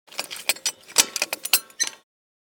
Garden tools rummaging movement

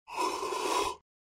Yawning inhaling and exhaling

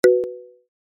Info pop notification help app

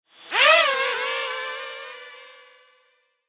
Drone toy takeoff fly away whir; rc toys

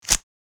Removing dart from holster

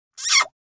Little girl kiss

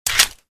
9mm handgun mag insert; War

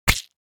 Paintball gun impact object splat; sport

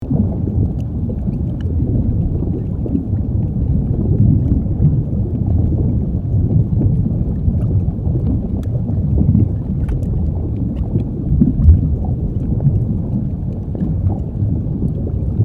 Volcanic lava lake close ambience loop; nature

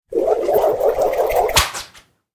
Whip whoosh and crack impact

